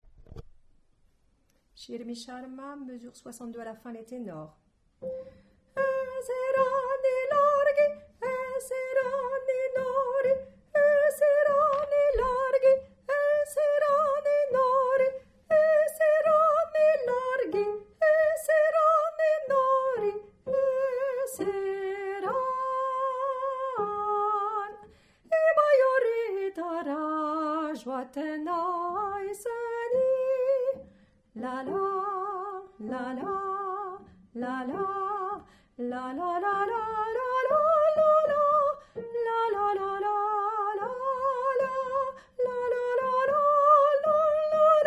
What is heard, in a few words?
tenor; Xirmi Xarma